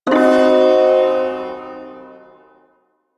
Ersatz Shawzin Blues Chord; Music; Chords

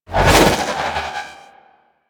Trooper Melee Spin; Warframe; DE; Sentients

